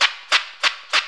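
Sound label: scratch L, vinyl FX; MIX FX; E-Lab; X Static Goldmine 2; akai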